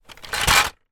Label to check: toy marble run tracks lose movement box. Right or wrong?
Right